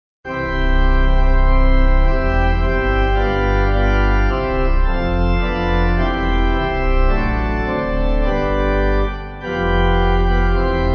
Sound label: Pipe; Lux